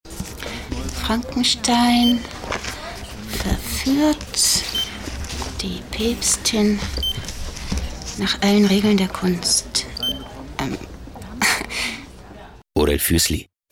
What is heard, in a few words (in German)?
Funk Spot kurz; Frankenstein